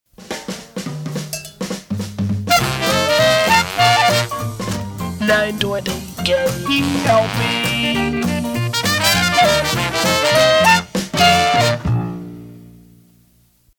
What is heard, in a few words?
Dixieland; KELP; Sono